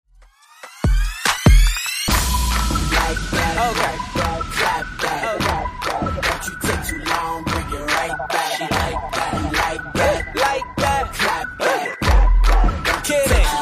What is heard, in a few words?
Clap